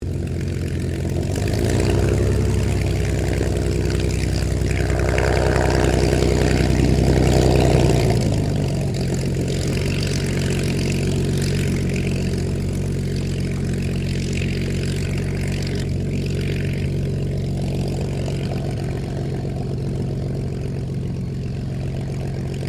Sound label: AIRCRAFT SUPERMARINE SPITFIRE MK-VIII TAXI STEREO; Airplanes